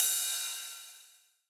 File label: open ride